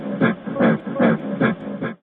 charge ape, Voices Emotes

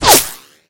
Bullet Whip; Guns Misc

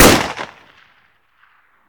AKM, Guns Fire; Weapons

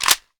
ltrifle magin, guns

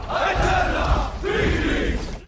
charge, Voices Emotes

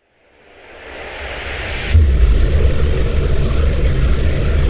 singularity form

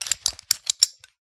belt equip

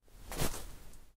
ice, footsteps

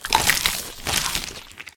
demon consume

